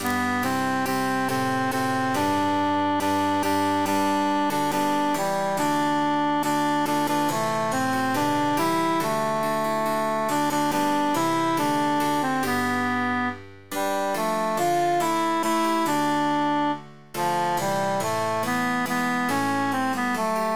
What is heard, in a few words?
quintus emphasized; now is the month of maying